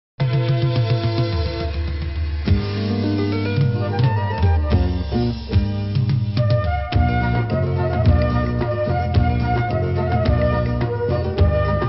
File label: instrumental